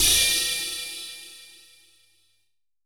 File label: NOIZ PER, NOISE PERC; AKAI S-Series CD-ROM Sound Library VOL-5